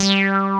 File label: 303 ACID BS; Pro-Rec - Synthsonic; BASSES